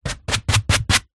household brush quick sharp hit style brushes on soft surface